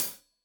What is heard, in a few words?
Hat Tight, Hi-Hat, Cymbals; Deeply Sampled Drums